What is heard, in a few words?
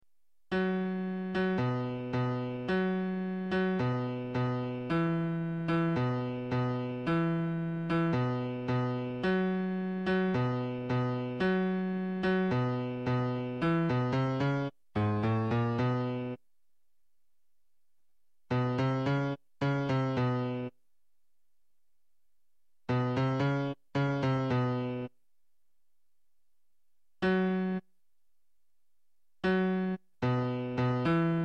pepito basses